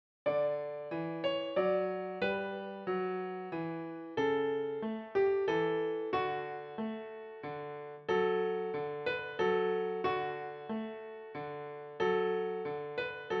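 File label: piano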